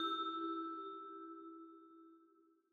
112 C Glockenspiel Tail, New Age Ambient Loops; Tail Samples; Spring

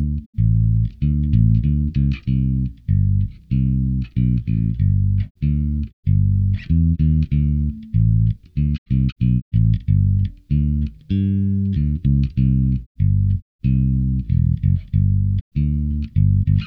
Bass Guitar

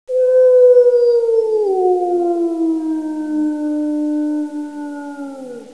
wolf howl, desert Sounds

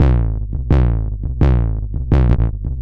808 Loop BD 85, Roland 808 Drum Loops, 85bpm

